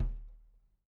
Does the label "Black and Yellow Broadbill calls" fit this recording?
No